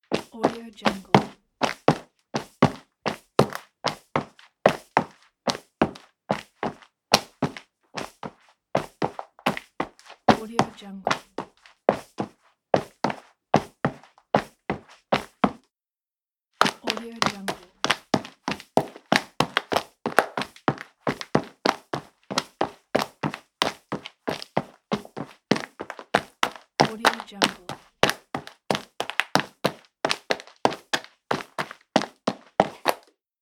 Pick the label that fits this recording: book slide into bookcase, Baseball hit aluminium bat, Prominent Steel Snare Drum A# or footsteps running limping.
footsteps running limping